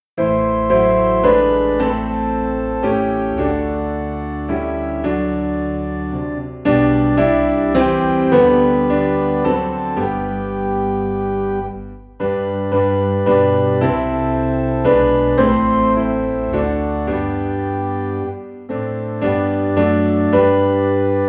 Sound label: Piano and Organ in G; Jesus the Very Thought of Thee